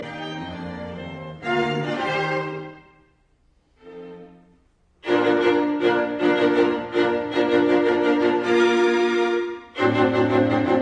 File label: String Quartet in F major, Allegretto vivace; Beethoven; Op 59 No 1; Rasumovsky